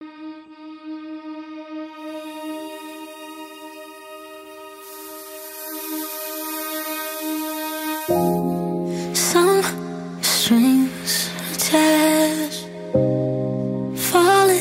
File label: Some Strings Attached Intro